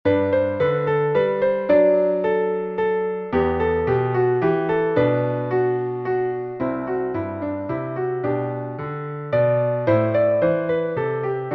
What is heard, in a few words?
Piano Solo